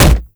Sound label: impact, wood chair